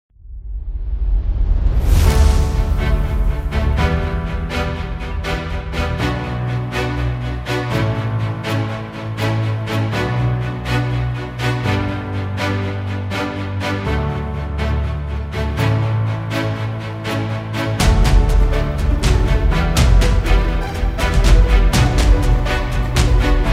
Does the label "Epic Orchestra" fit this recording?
Yes